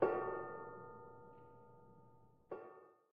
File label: Strange Noise; Lethal Company